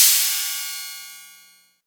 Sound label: crash cymbal single hit c key, Royality free crash cymbal audio clip tuned to the C note